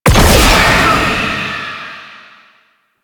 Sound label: Unhallowed Curse Hit; Warframe; Warframes; Dagath